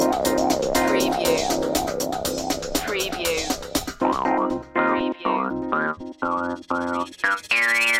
fonk loop breakdown end

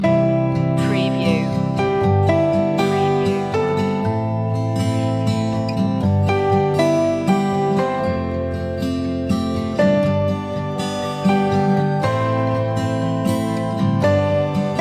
piano dream

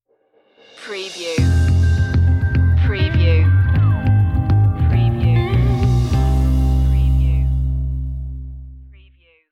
showroom stinger

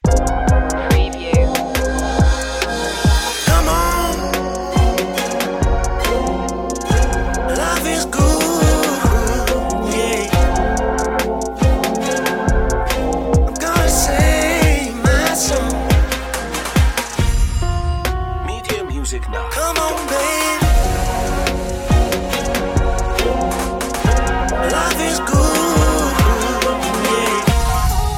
smooth soul vibes

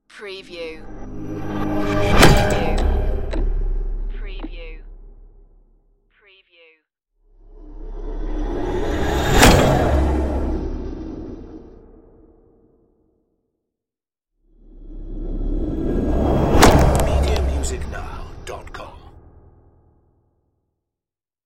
wormhole asteroid impacts

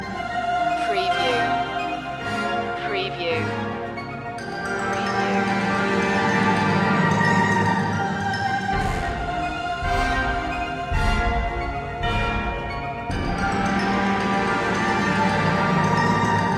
swift wings loop

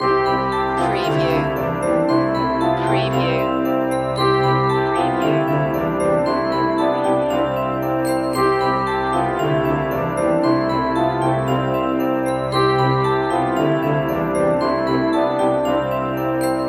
xmas loop

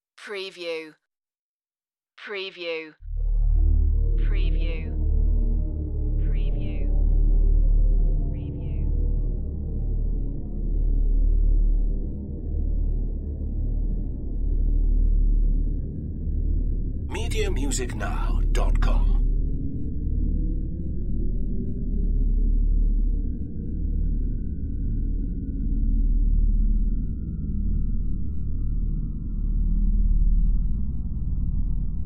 Deep Evil Bass

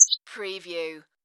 Tweet

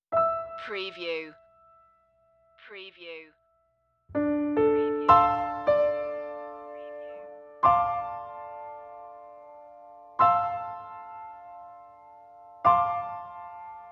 emotional piano cue

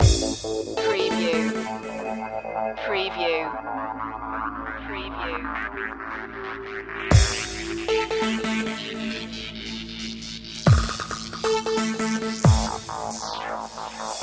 electricity loop

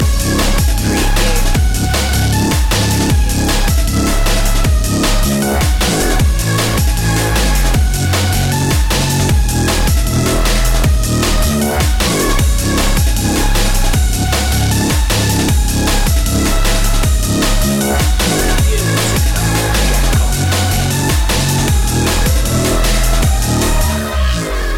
bass tricks loop

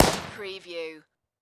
Machine Gun Vickers

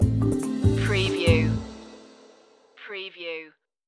enter out stinger

